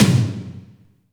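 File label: tom drum, left; Best Service; Real Mega Drums VOL-1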